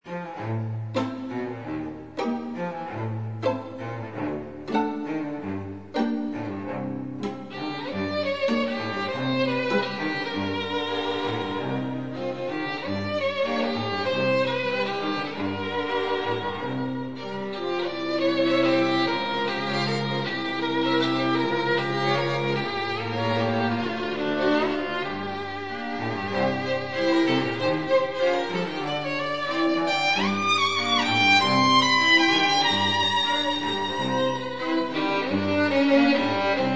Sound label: quartet 2 violins viola cello